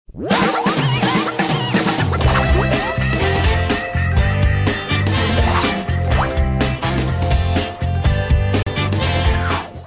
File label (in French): musique intro